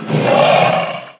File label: pain; quake2; makuta